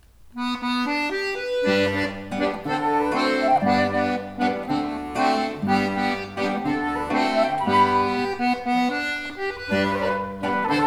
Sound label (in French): Les Filles de Majorque en Trio; extrait de S Delicq; arrgt C Coulombel